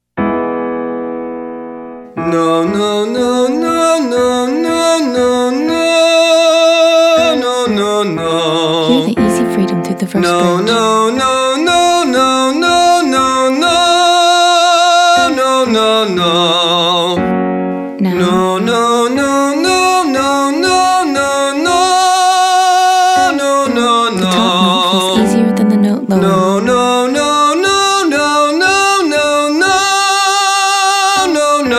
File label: Exercise No No No male; Singing